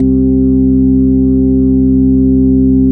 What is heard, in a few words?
MELO PERC